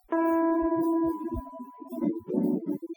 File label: Latino